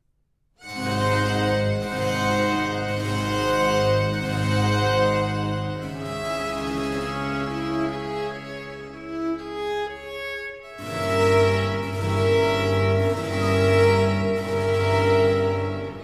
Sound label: Concerto for 2 Trumpets, Strings and Continuo in C Major; R. 537; Vivaldi